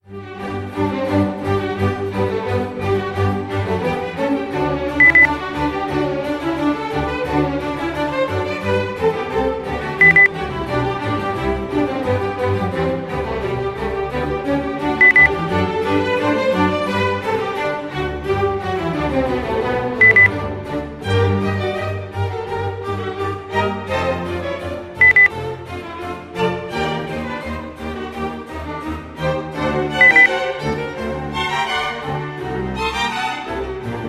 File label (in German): klassik